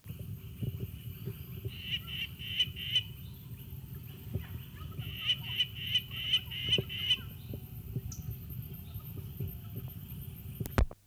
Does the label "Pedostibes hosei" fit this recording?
Yes